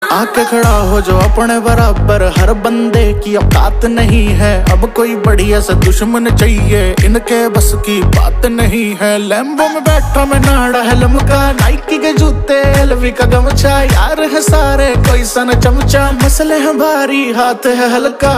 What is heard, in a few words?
Ringtone